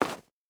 stag footstep snow hard; Rust

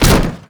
punch wood

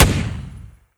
Automatic M3P End, Weapons; DCS

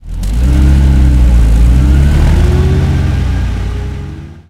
centurion engine load, tanks; War Thunder

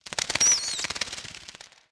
bat; World of Tanks Blitz; karelia halloween

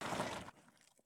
prone asphalt; Escape from Tarkov

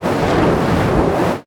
damage hits explosions, Warships

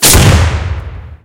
tank hit; War Thunder; sfx tanks

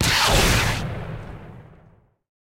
rocket start; guns; War Thunder

